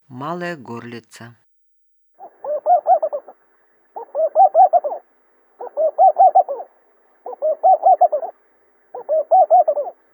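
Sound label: laughing dove voice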